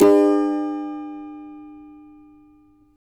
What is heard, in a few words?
CAVA A MJ, Guitars; Spectrasonics; Hans Zimmer Guitars VOL-2; CAVA CHORDS